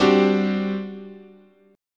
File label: G Major 7th Suspended 4th, chord